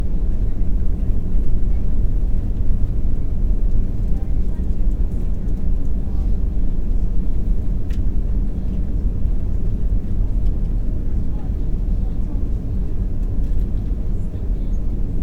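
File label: interior airplane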